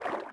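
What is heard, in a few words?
water run, footsteps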